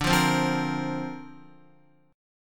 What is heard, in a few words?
D-Minor 7th Sharp 5th D Guitar, chord; Listen to Dm7#5 strummed; JGuitar